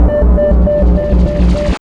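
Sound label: sub synth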